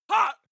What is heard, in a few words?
male attack groan short; schreie; menschen